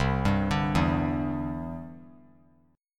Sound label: C Major Add 9th, chord; arpeggiated